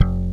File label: slap, bass